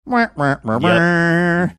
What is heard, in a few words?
doing price is right fail horn